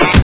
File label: knife slash; cstrike; JailBreak; Defibrillator